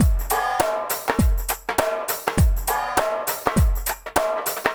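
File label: LO-FI, breaks; Spectrasonics; Liquid Grooves 1 & 2; BR.SHUFF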